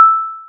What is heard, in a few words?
disengage; IQ.Pilot; selfdrive